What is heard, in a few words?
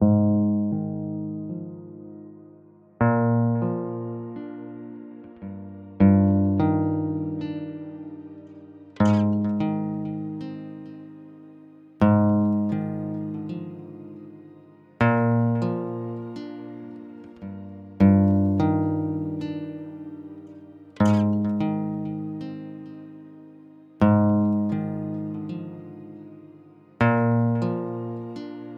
sinewaves